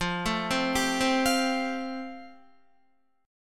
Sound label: F Major Add 9th down, Fadd9 chord, Guitar; arpeggiated; JGuitar